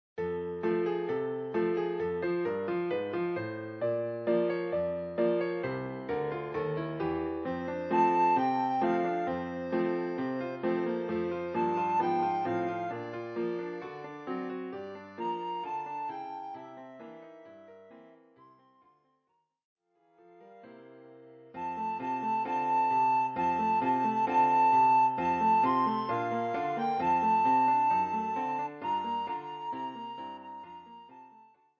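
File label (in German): Jingle Bells Blockflöte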